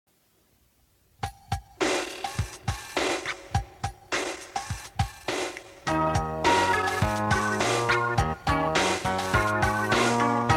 Funky Bass Wobble Pan Flutes

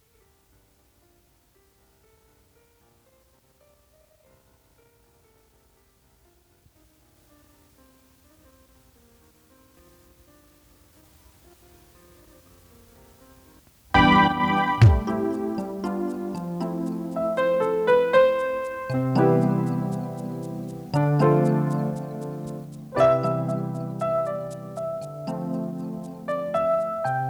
Piano Solo

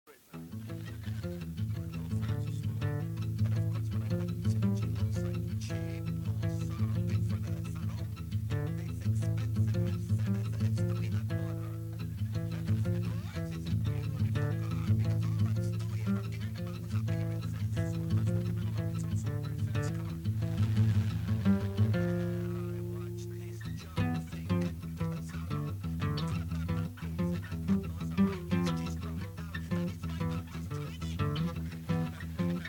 Guitar Rythm Metal Basis

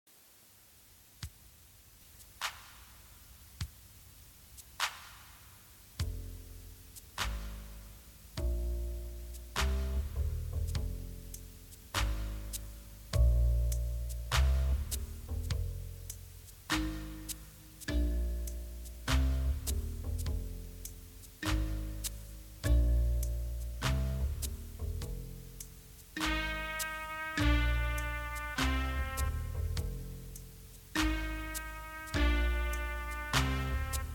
detective no vocals

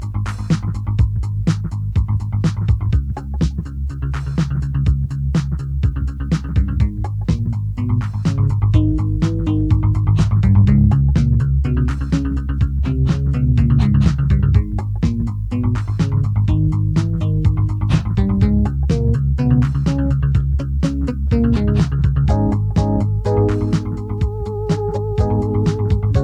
Piano Rythm Bereft; tape

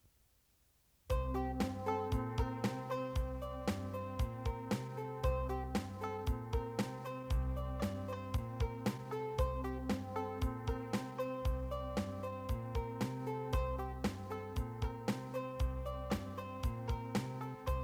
banjo melodies

